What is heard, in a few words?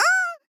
Grunt Hit; Fairy; Paladins